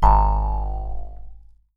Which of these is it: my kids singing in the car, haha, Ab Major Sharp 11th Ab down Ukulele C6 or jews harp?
jews harp